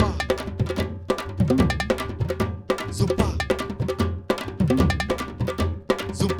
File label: 150 Mixed Perc Set